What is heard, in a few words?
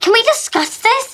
lose; donut normal